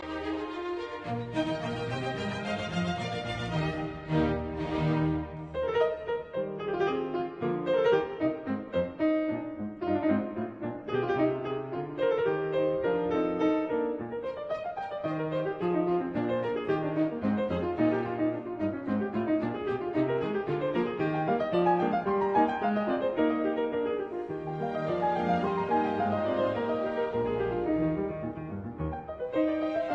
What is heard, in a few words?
Piano Concerto in E flat major Allegro ma non troppo; Piano Concerto No 14